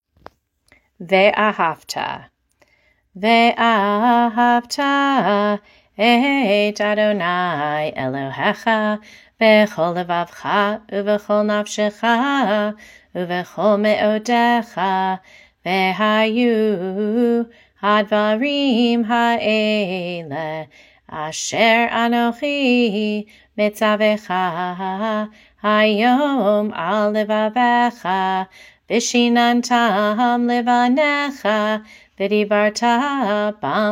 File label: Vahavta chanted; bnai mitzvah; barbat mitzvah